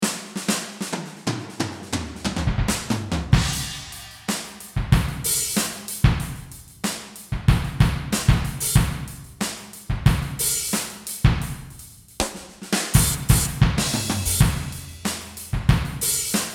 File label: Drums